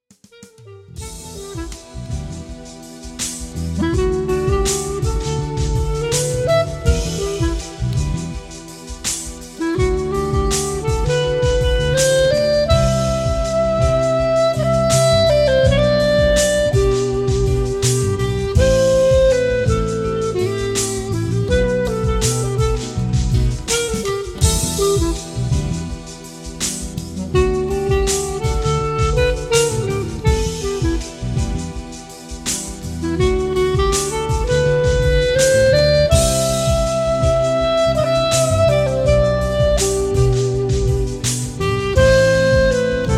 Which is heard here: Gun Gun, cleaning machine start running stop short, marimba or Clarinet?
Clarinet